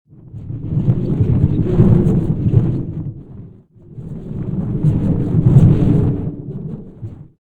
snowsquall loop

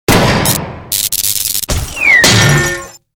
zap stagelight hit

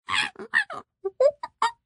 monkey long

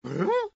horse question

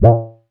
spring place

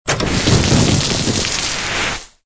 throw stormcloud miss

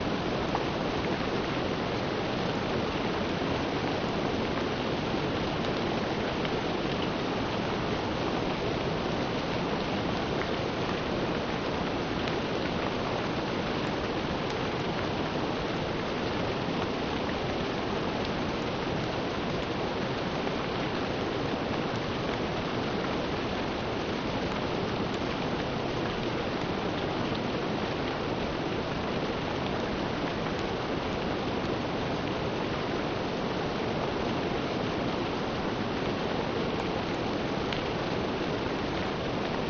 oil

